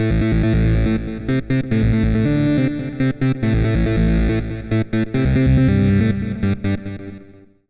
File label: HARD EXTRA 140, Hard Trance